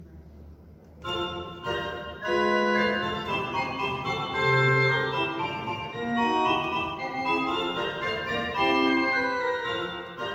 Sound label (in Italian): organo processionale, basilica; basilica san marco milano; organista riccardo di sanseverino